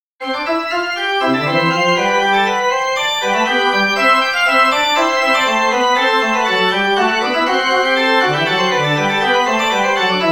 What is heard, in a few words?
Baroque Invention On Organ